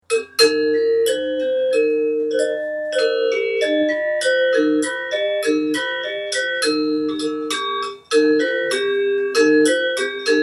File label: penesehan sangsih loceng, gamelan